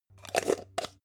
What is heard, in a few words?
jar plastic midsize close